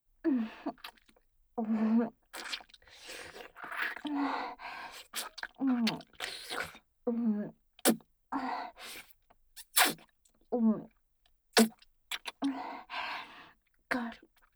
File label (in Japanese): ねっとり乳首責め＆フェラループトラック, ASMR